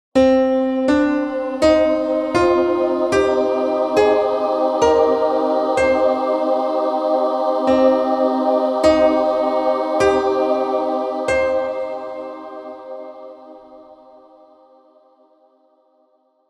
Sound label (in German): der C Mollakkord